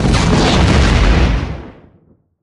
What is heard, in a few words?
medium explosion; factorio 2.0.55